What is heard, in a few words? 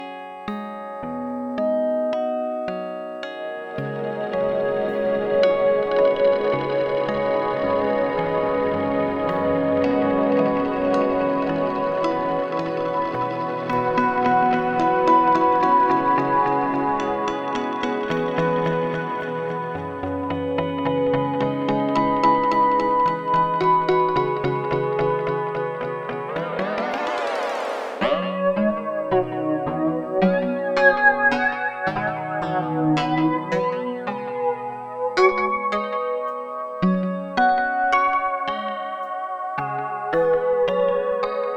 Predelay Manipulations; StarLab